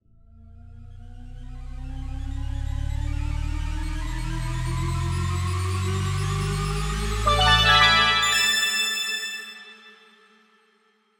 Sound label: Video Game Console Startup Sound